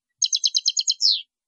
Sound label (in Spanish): chacheada; campero